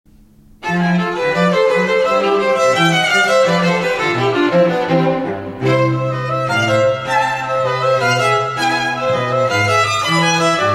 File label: (String Trio); Cantilena South Florida